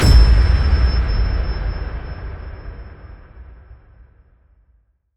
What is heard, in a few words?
iron hit impact drum kick